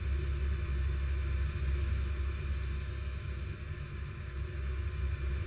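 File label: subway interior; Soldier Of Fortune II Double Helix; XBOX; ambience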